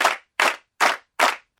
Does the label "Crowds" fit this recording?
Yes